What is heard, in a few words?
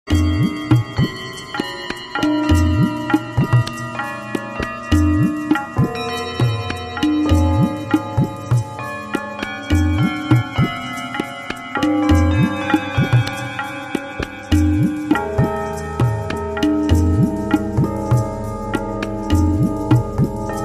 Whistle Bowl